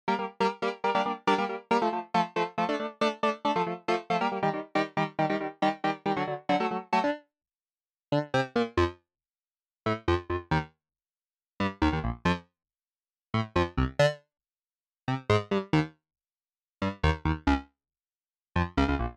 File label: Polymorph Contivox Org